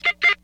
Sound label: jungle sound; Graz